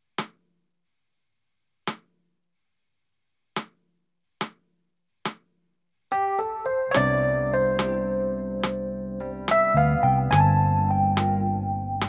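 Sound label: Backing with click, Concert Backing Tracks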